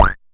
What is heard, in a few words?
Amdek Perc